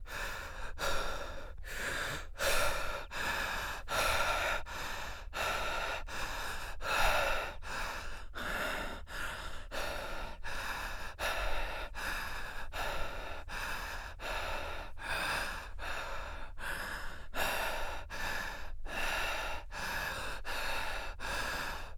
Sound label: breath, Anechoic, bicycle; OPENAIR